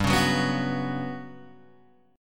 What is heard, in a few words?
G Major 7th Flat 5th G down Guitar Open G